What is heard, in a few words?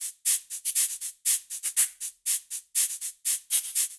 Cabasa; Latin Percussion; Instrument Kits; Ableton; Factory Packs